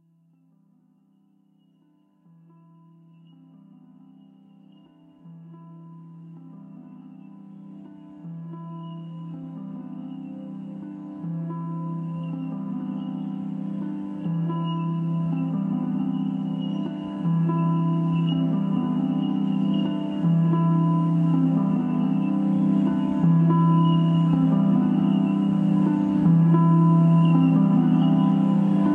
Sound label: Leaves And Piano